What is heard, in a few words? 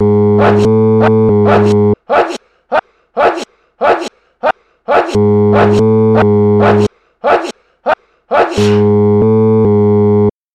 Sneeze Mix